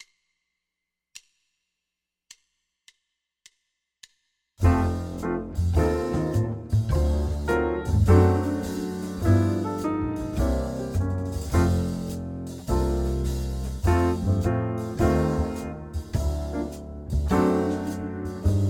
med tempo bass play along, jazz

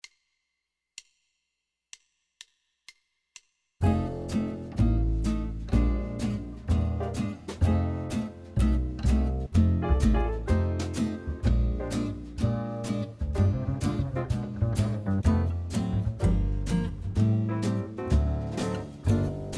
horns play along, F blues, jazz; LMEA All-State Jazz

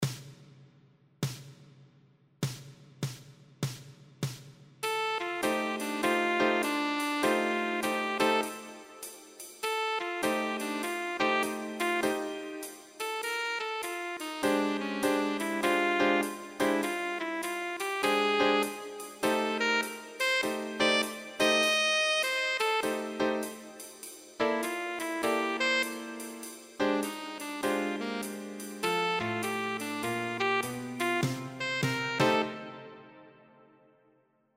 PLAYALONG BASS

